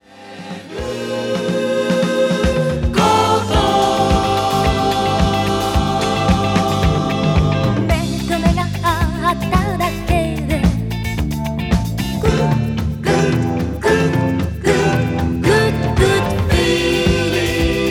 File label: idol